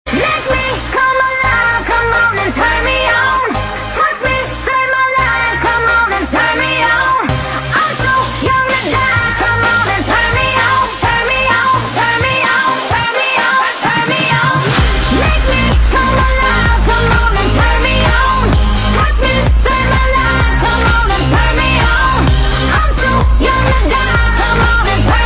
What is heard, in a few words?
english dance song tone ringtone; english ringtones